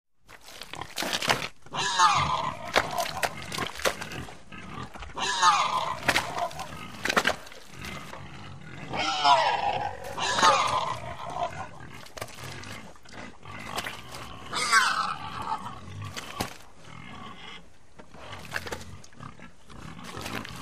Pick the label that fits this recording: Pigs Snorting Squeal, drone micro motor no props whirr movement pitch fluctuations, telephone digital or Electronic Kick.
Pigs Snorting Squeal